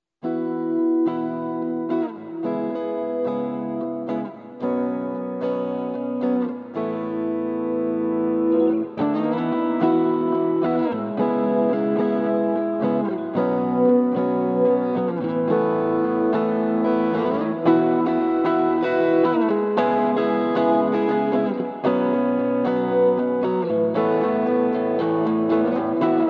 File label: progression practice